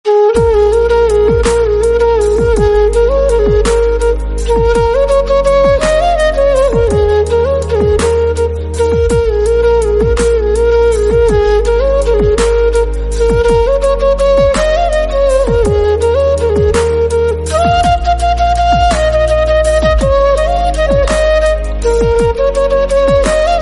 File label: Flute Ringtone